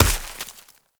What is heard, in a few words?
Snow Ball Impact; Warframe